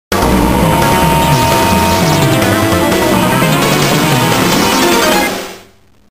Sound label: victory; Gladiators; jones